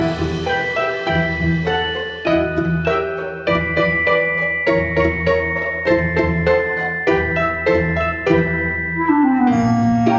player piano; Sugar Plum Fairy

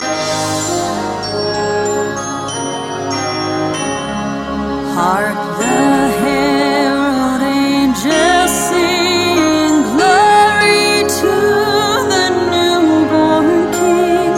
Hark! The Herald Angels Sing, Player Piano

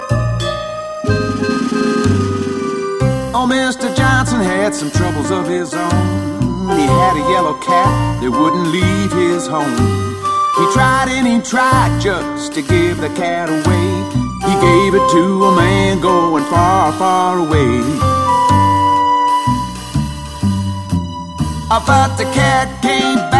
The Cat Came Back, Player Piano

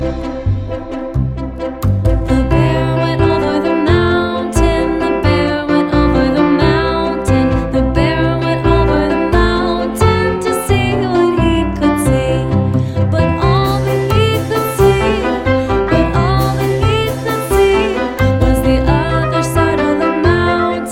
Bear Went Over The Mountain, Player Piano

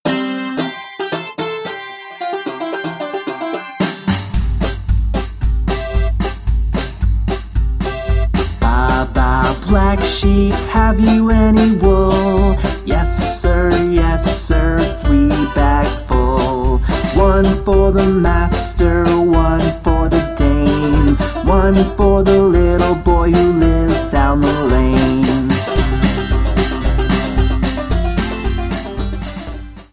Baa Baa Black Sheep, Player Piano

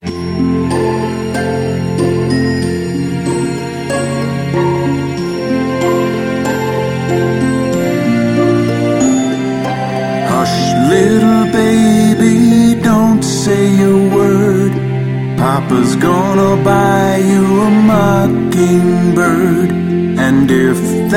Hush Little Baby, Player Piano

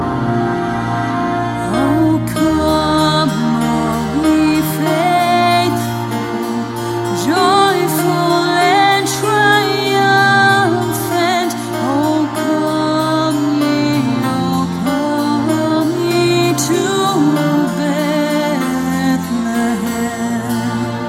O Come All Ye Faithful, Player Piano

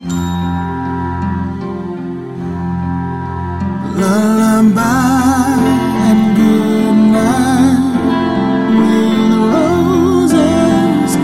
Lullaby, player piano; Brahms's Lullaby

